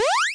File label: 8bit kit jump, 8 Bit Videogame Kit; LO FI and 8 BIT KITS